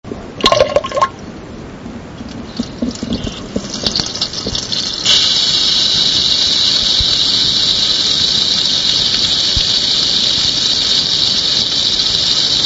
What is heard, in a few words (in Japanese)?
toilet